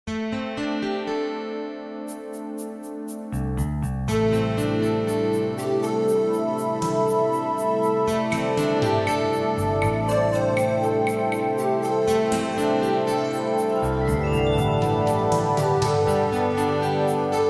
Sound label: dreamy